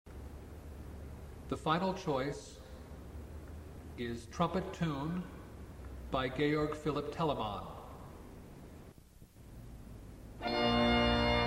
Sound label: trumpet tune; telemann